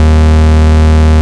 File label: Root D#, Bass